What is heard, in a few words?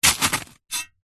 industrial shovel space metal single dig into gravel stones; zapsplat